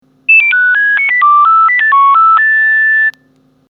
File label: Nokia Tune